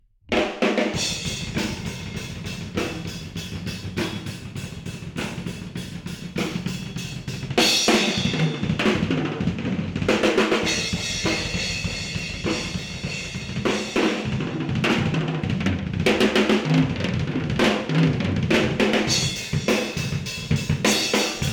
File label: Solo Drum; olofonia